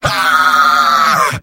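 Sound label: engineer pain critical death; tf2; mvm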